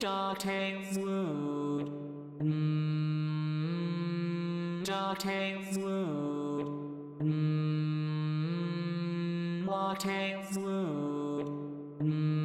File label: Bass, barbershop